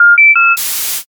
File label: modem